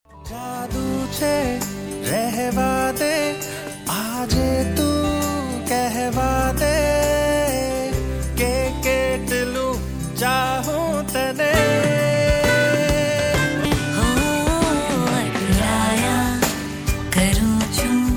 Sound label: gujarati ringtone